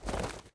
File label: body Get Up marble